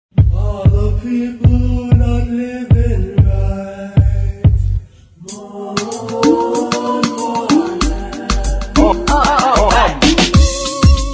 A classic reggae riddim mix with a smooth bassline